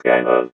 Ghoul Voice; enemies; unsighted

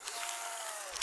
Camera Broken Print Rev

